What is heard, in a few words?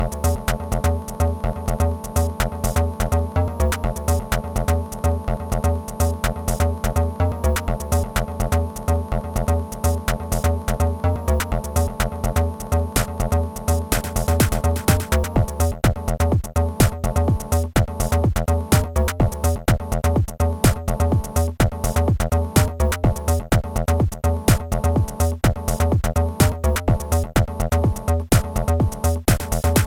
trance chip; Trackers; Modland